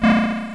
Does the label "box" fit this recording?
Yes